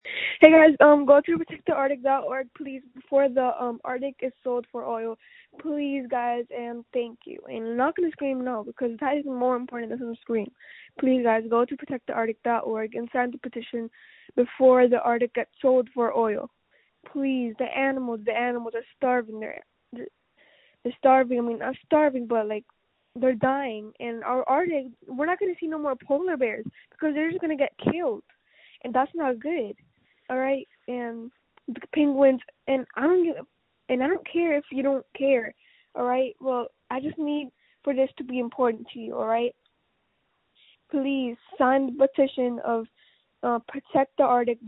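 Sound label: screams that feature laughter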